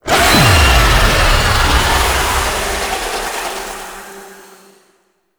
Boss Vocal Death Stinger; Warframe; Oraxia

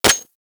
Redux Fire Mech, Weapons; Lato Vandal

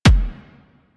Weapon Punch Rifle

